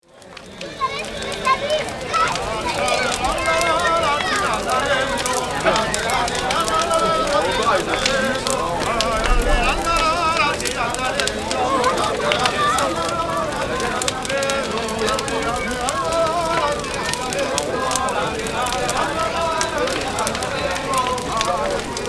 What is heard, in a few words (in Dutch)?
zang